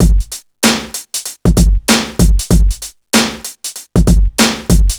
96 bpm drum loop g key, Free drum loop - kick tuned to the G note. Loudest frequency: 1844Hz